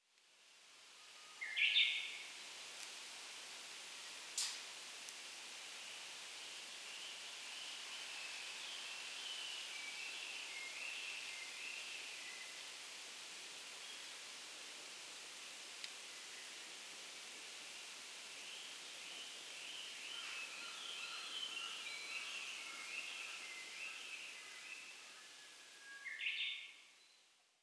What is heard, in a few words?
bird